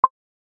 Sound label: beep